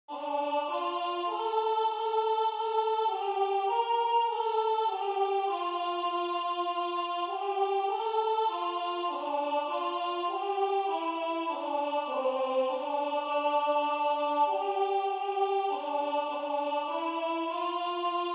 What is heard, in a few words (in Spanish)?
Veni veni Emmanuel Estribillo a 2 voces F SOPRANO destacado; ADVIENTO; Adventus